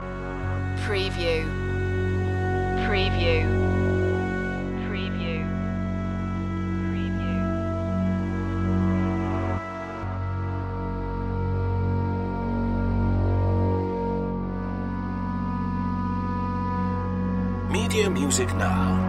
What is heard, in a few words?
indian morning loop, chill